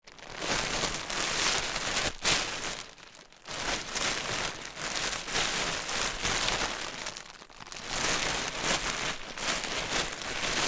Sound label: Going through plastic bags